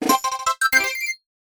All Coins Collected Fanfare; New Super Mario Bros.

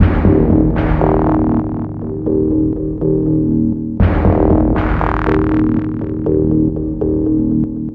Loading Music Loop; Evolva; Windows

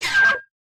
Kill Baboon

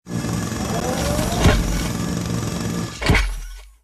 Idle Drill; Mountane

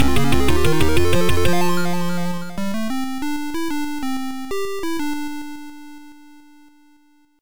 Level Complete; Turrican 2; Atari ST

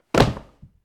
hit body

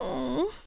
Female Tired Breath

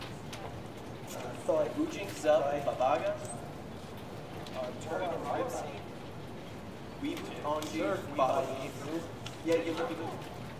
journal loop; The Sims 3; Windows

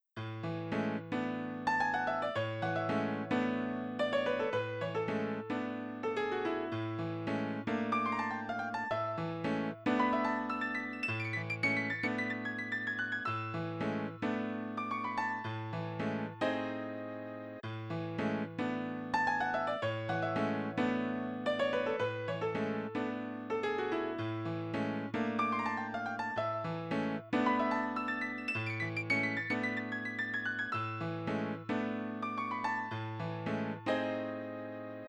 Piano; kuro no juusan gamerip

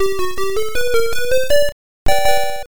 Bonus Start; Calorie Kun vs. Moguranian; arcade gamerip 1986

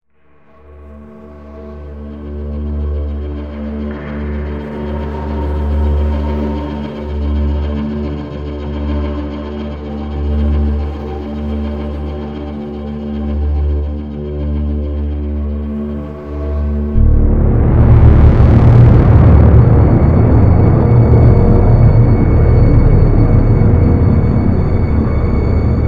Door Breach Stackup; medal of honor warfighter; Chitral

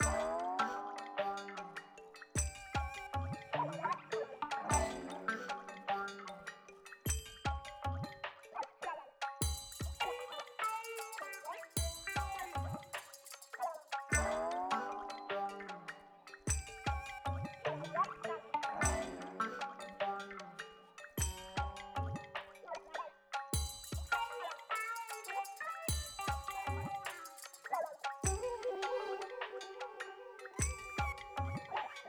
BGM Plaza Gear Shop

